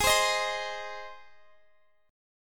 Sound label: G sharp Major, Guitar, Open F; strummed; x,x,20,19,18,19; JGuitar